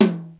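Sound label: tom high; xr10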